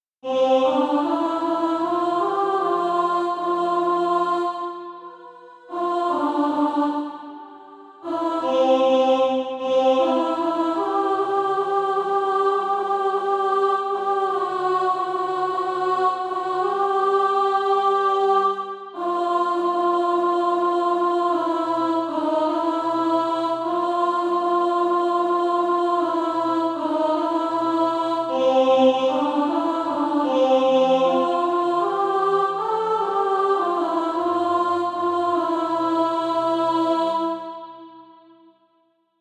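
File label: alto, SATB Practise Music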